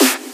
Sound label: Snare; Vengeance Samples